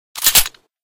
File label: handgun unjam; Weapon Parts Overhaul